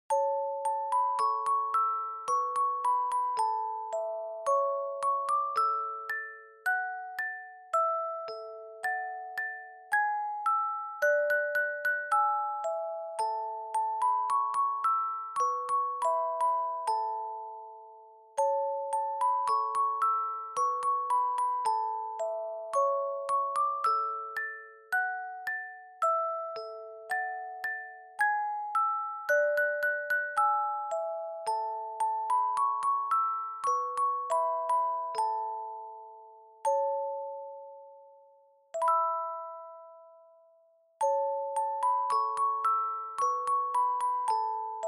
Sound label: Dry Fast